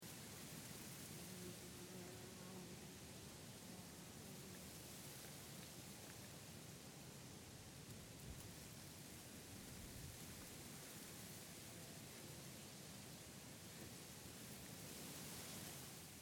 Wind Over Wheat Field Long: A moderate wind blows across a wheat field; Can also be wind through trees, leaves; Wind Sound Effects